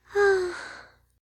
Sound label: Cuddle; Bedroom; Hilzartov; Fairy Size Doesn't Matter